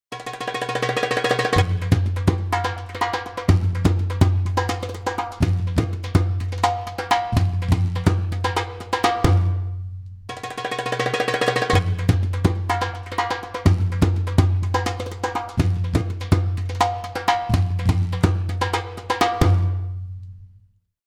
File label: middle east ringtone